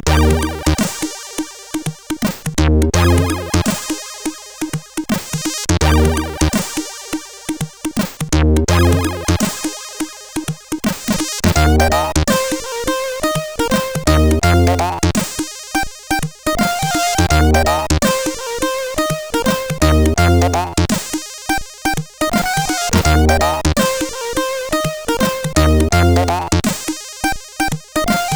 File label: Shuffle, CSG8580R5